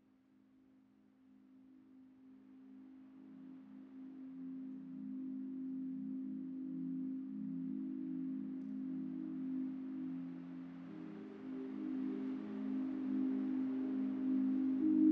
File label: Forest Soundscapes Medium River; Deep Sleep Meditation